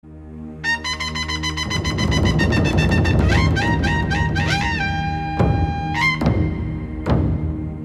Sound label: Sikh Nagara